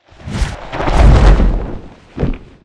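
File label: flyer land medium distance; boneyard; Titanfall 2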